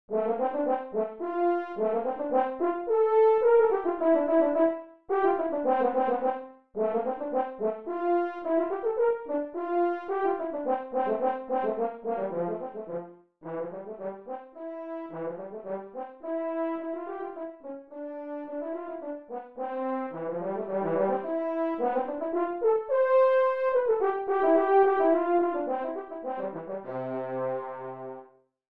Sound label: French Horn